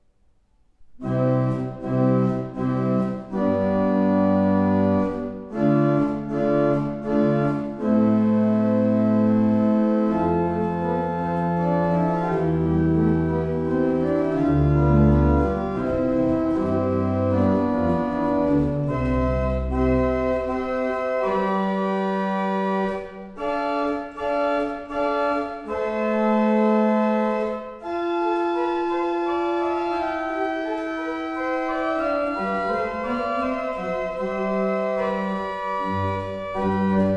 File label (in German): adagio C-Dur, orgeln; dietingen